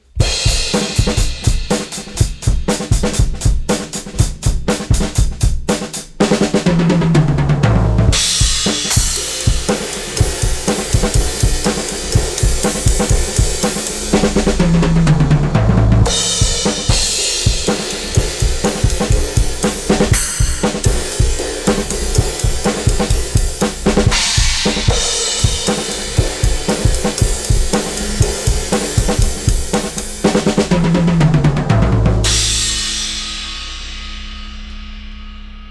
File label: drums; SKYE Clipper; TAPE MACHINE